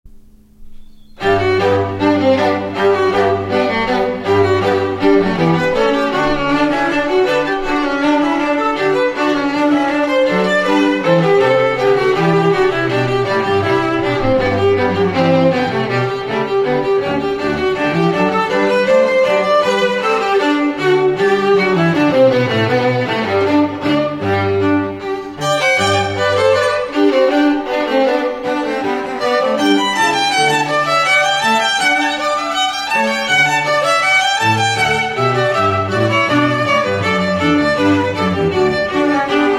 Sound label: String Trio; Bach Brandenburg 3; Cantilena South Florida